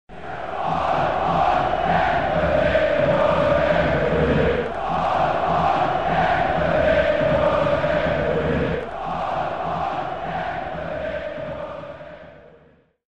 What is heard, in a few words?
crowd